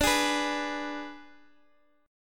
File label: Ab Diminished D